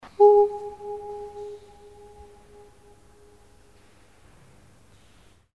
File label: echo at St Therese crypt; Holiday 2001 in France